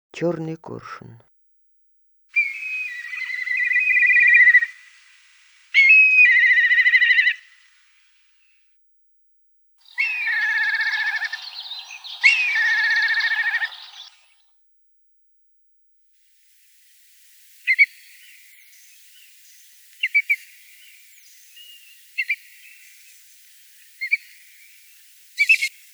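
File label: black kite voice; korshun chernyy golos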